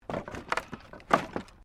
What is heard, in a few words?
Wood Movement Minor